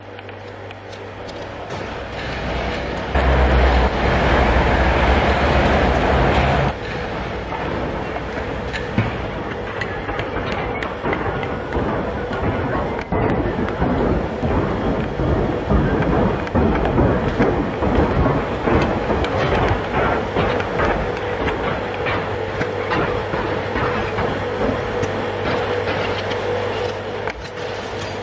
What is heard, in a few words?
Electronica; noise